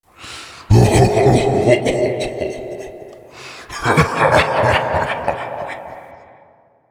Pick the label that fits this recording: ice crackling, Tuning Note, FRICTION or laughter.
laughter